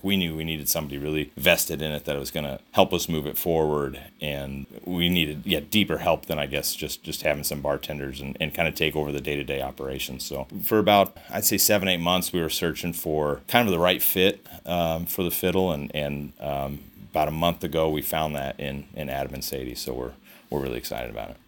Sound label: Fiddle Buzz